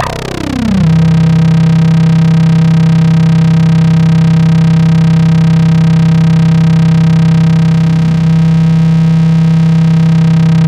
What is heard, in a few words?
C1, SynthLine